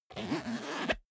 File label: zipper small case open